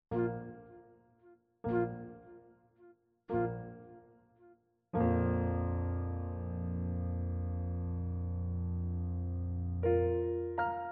Slow Stereo; Uebungs-CDs; Playbacks

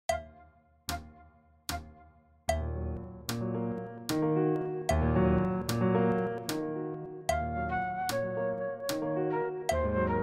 piano flute fast

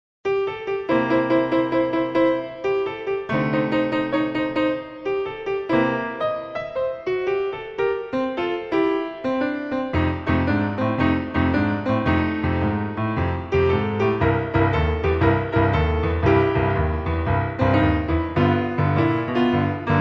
Boogie Machine

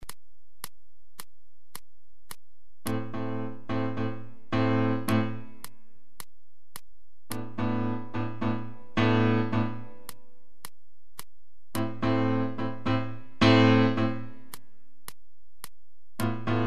Tango 108; Seiber; Rumba Bis Walzer

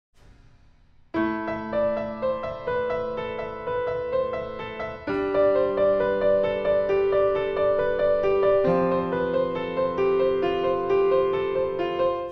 Piano Play

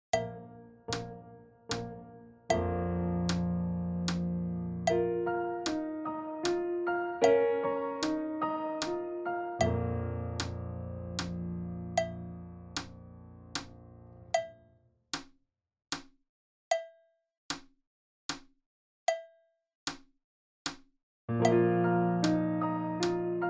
piano fast; Uebungs-CDs; Playbacks

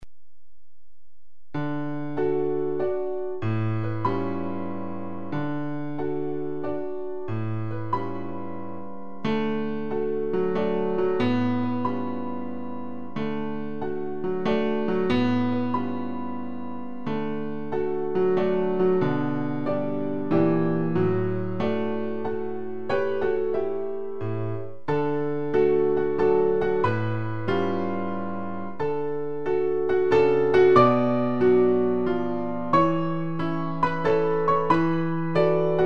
Waltz 96; Seiber; Rumba Bis Walzer

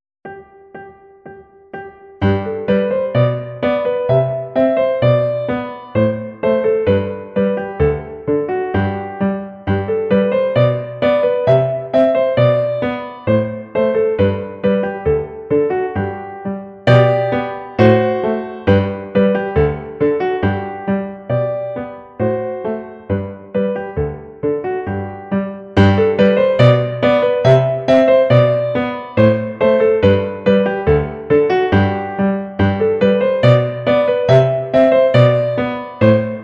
Burlesq G-Dur schnell; Mozart Leopold

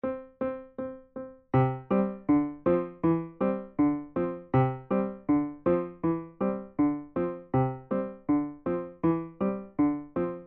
Sailboat 160 Secondo

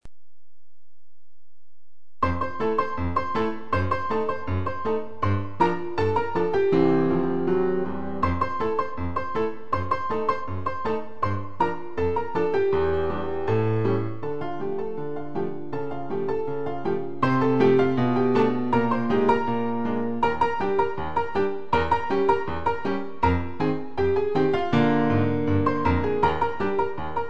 Ragtime 160

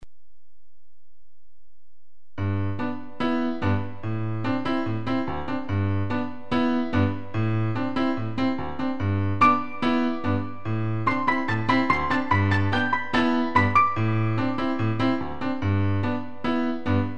Rumba 145; Seiber; Rumba Bis Walzer

